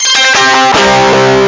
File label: Power Chord; Amiga-CD 1996 #5-6; sampler; Instruments